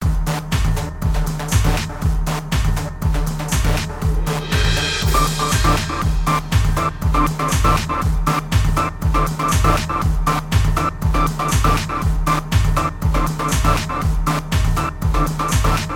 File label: Electronic Club Rave Music